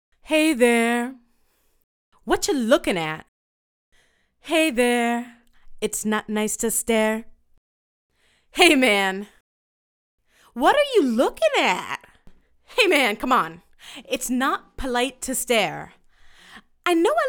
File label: Vocals